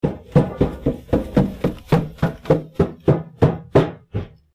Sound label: upstairs; CLANNAD FULL VOICE; Key